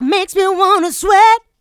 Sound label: voc, female vox, Vocal House